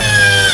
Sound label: HYDRAULIC; Northstar; The Wizard VOL-1